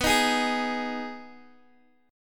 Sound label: B 6th B down Guitar DADGAD